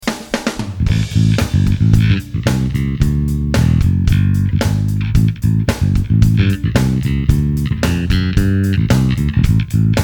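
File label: SVT, Bass Driver D.I.; sansamp; Musicians' Friend SVT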